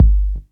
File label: kick drum single shot g key, Royality free steel kick drum sample tuned to the G note.